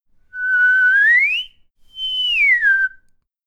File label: portamento